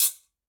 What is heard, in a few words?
Guiro C Up; Latin Percussion; Drum Hits; Wood; Ableton; Factory Packs